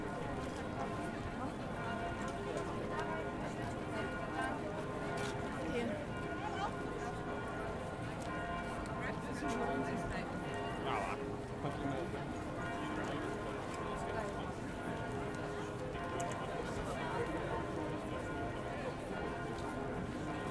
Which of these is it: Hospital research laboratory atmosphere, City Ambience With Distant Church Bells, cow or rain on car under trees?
City Ambience With Distant Church Bells